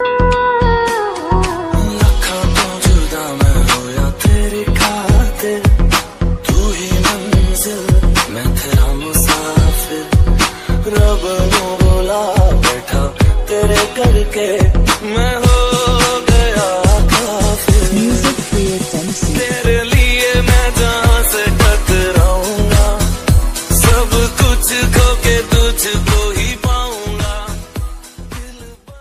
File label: hindi love ringtone; bollywood ringtones